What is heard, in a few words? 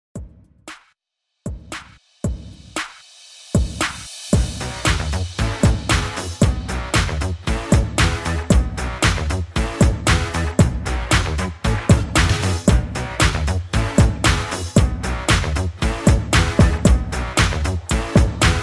HALLOWEEN REDRUM 115 BPM